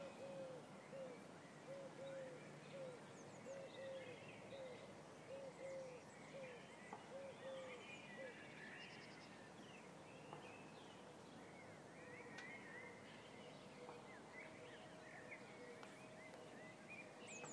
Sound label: york car park ambience